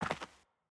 Footstep Dirt

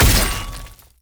Attack Heavy; bloom minigame

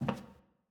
Footstep Hallway

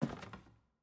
Footstep Wood

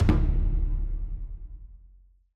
UI Event; bloom minigame

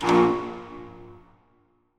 music puzzle note up

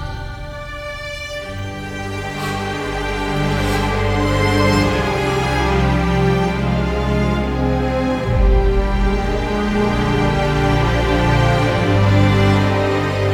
Boss 70BPM

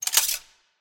Monkey Shaft; Puzzle

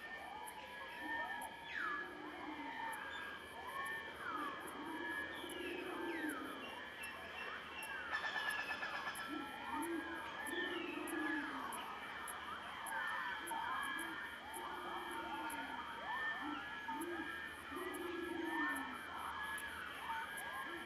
Emitter Birds

